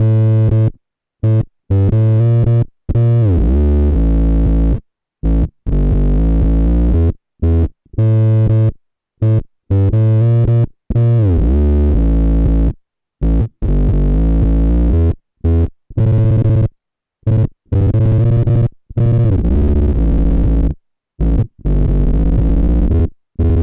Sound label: clipped OC2, bass; Bass into GT-10B, OC-2 in the FX loop, then XLR out to my interface. No compression, amp sim, etc, just dry bass; Clipped BOSS OC-2